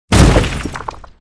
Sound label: drop boulder miss